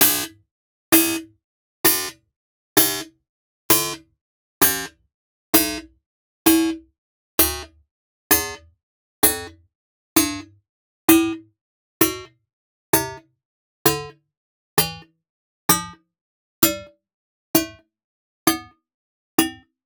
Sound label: strings pizzicato